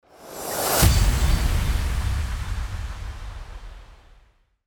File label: RISING IMPACT